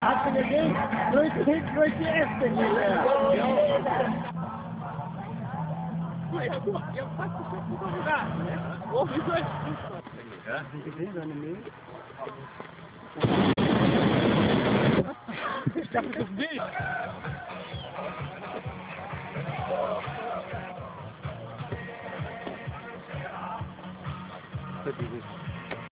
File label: ballon small